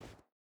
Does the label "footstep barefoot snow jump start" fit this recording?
Yes